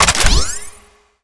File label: sci-fi weapon reload; Space Wave Race